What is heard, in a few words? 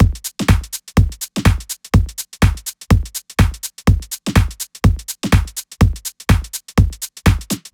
House Drum Loop 124 BPM; 99Sounds x Monosounds; Dance